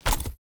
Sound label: ui submenu open; Shelter